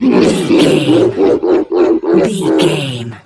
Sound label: troll monster laugh